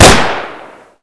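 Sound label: usp unsilenced, weapons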